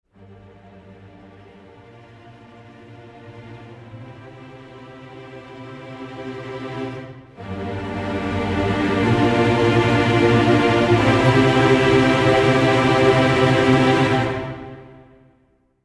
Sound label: Full Ens Tremolo; Audio Imperia Areia